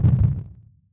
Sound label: sizzle; ASCIIpOrtal